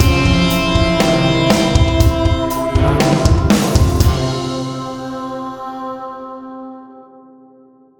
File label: An Arabian World Short Stinger